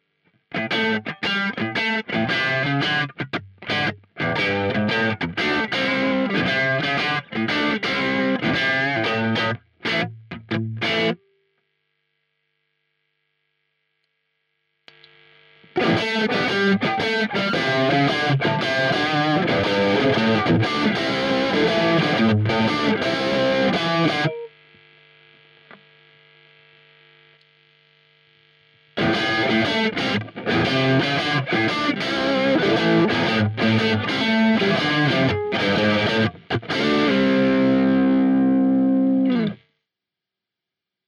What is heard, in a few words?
KATANA MARSHALL